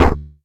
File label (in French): perte Fuild; Puddle